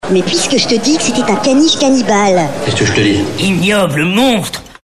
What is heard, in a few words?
jingle; ignoble monstre